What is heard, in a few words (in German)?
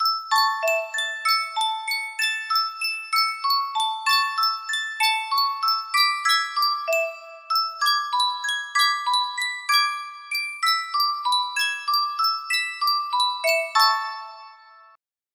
Spieluhr